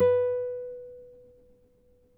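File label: nylon, FLAMENCO, Guitars; Spectrasonics; Hans Zimmer Guitars VOL-2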